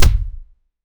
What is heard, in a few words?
short impactful, fantasy; create a short impactful